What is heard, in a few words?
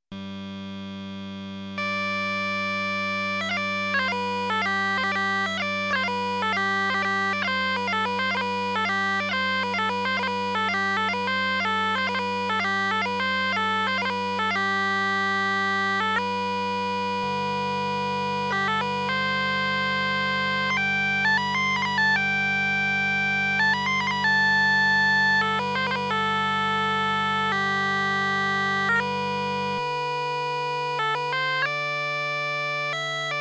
french 16 inch; technopipes